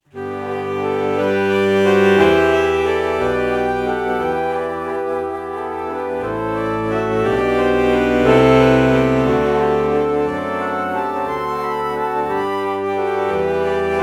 alto flute alto saxophone morph

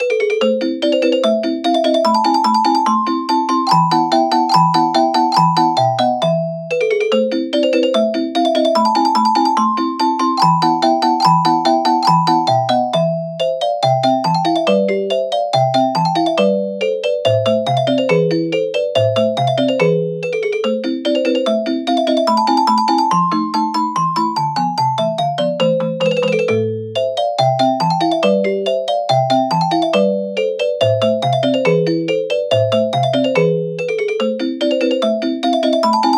celesta

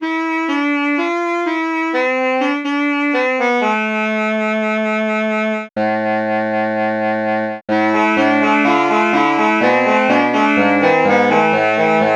alto sax

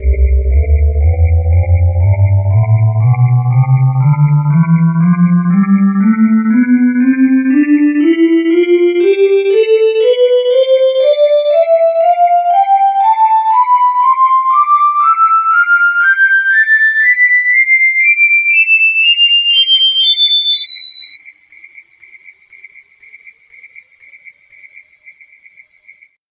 metal pad tonleiter

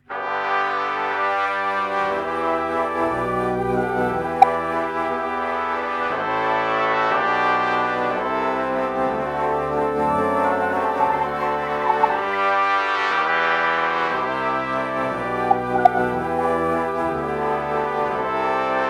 alto flute trumpet morph

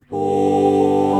alto flute oh morph

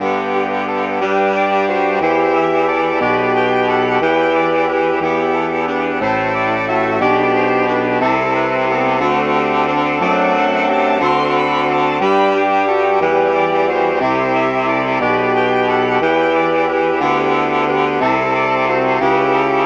alto sax

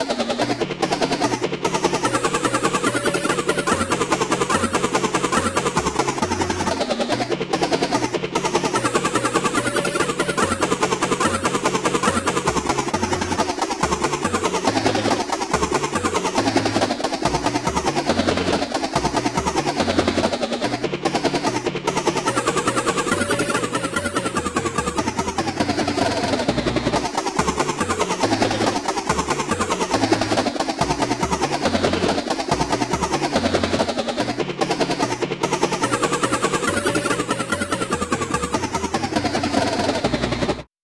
helicopter

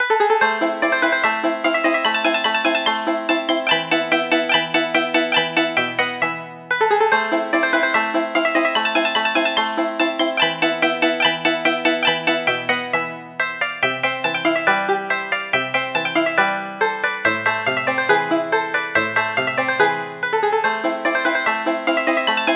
banjo

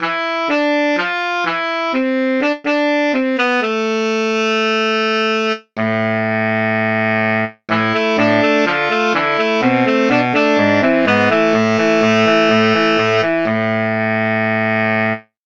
tenor sax

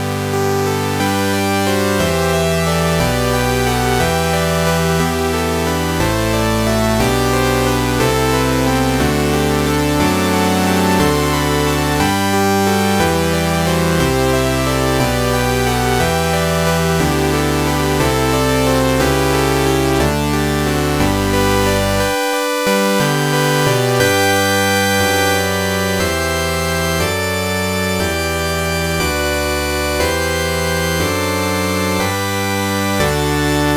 bass lead

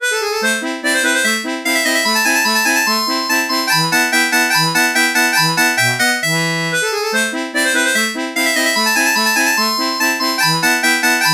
harmonica

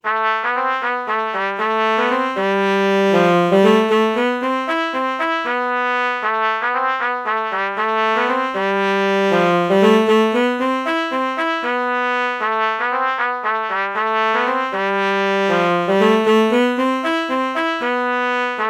alto saxophone trumpet melody morph low

